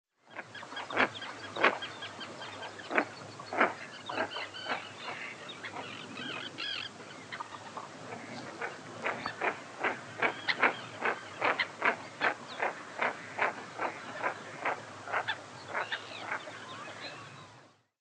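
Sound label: red-breasted merganser voice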